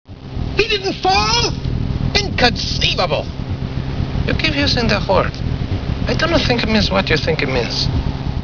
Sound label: button; tfc